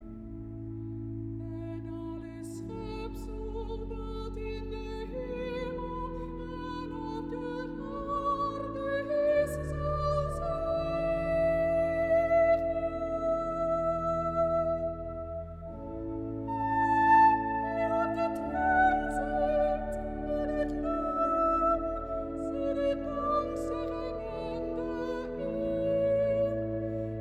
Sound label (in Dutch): Koor dames met solist vrouw